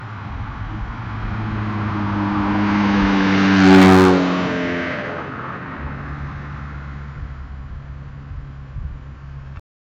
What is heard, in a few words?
the sound of a car, offroad